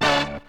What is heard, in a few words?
house rocking, Stabs